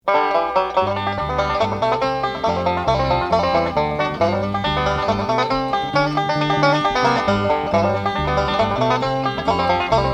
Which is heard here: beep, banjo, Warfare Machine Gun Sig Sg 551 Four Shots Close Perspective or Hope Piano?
banjo